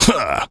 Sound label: "huh"; Postal Dude Voice Lines